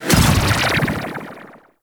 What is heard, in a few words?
Staff Jab Hit; Warframe; Monkey King